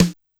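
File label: E2 Analog Snare Drum Ana+Acoustic, Dance Kit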